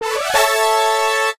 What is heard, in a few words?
Jingle Fanfare; Pokemon Smile